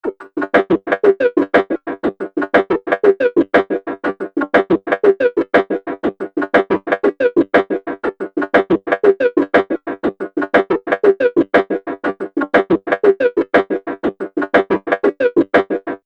120 Bpm Android Groove Loop, Robot; Looping

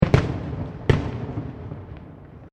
Fireworks Multiple Explosions; Fireworks (Sound Effects:Backgrounds); Crafted Signals

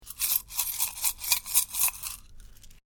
Money Shaking Some Coins

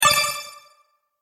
Sparkle Strike, magic sound effects

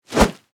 Whip Whoosh Rope, Heavy; Foley; Sound Effects:Objects; BlastWave

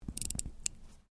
Watch Clockwork Wind, Click; Clicks (Sound Effects:Objects)

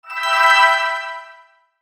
SHIMMER BELL, Shimmer Bell Ring Swell; High Tone; Blastwave

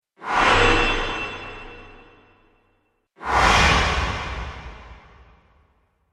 Sword Whooshes Large Hall; Sword Sound Effects

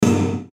Hit Metallic Rim Shot Reverb; Production Element Imaging Element Accent Transition; Metallic Sound; Blastwave

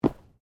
Body movement Organic Impact Light; Body movement foley to simulate falls and deaths; Wrestling And Struggling Sound Effects

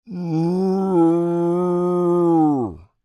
Creature Moan Long, Human; Groaning; Voice; Creature,Human,Moan,Long

